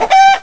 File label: Cucco